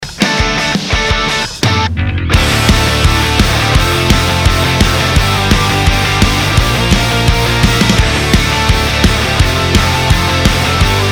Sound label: instrumental